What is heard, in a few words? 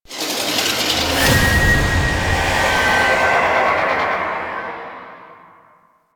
UI Area Cleared; Warframe; Entrati; Swarm